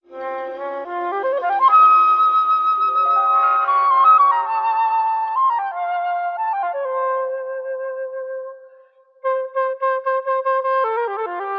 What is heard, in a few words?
flute nonsense man, Loops, Jazzmatazz